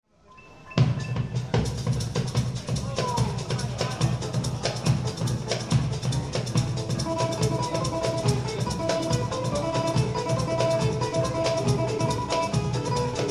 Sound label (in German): Samba, Quartett